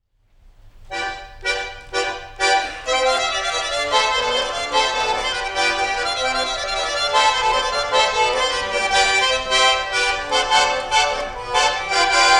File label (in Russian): Матаня (плясовой наигрыш), Деревня Большой Кутунал Скопинского р-на Рязанской обл